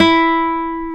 Classical Guitar, Nylon String; Roland LCDP02 Guitar and Bass

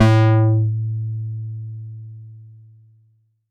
Sawtooth a3, Korg Poly-800

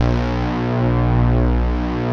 VFX SYNTH; Soli-Music; World of Synthesizers VOL-1

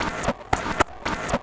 CYBER ALARM, CYBER TOYS; Spectrasonic Distorted Reality 1

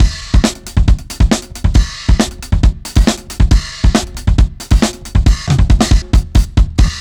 Big Brown, Breakbeats, Drum n Bass; KJ Sawka; Drum n Bass & Beyond

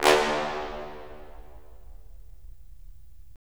BRS, Roland LA Composer

